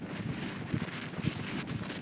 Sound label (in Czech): auto po cestě; Mafie 1